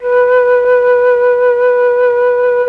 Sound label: FLUT1; ILIO; Propeller Island Legendary M400